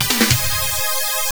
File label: Continue Jingle; Truxton 2; Tatsujin Ou; Toaplan; arcade